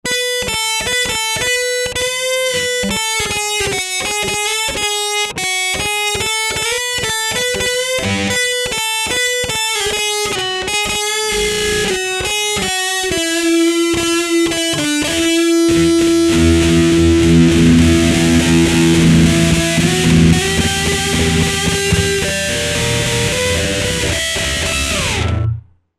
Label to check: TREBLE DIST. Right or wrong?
Right